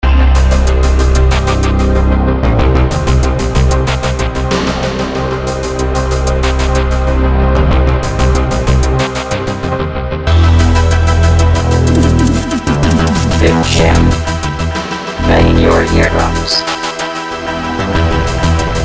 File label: Jingle; Bitjam Space